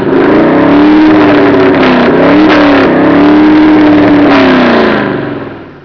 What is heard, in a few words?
rev it up